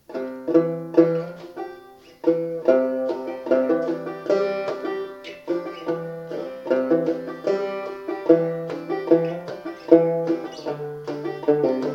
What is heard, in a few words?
if you banjo, Banjo: Open G; Capo 0